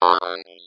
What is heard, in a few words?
pseudo vocode; Scars FNC SFX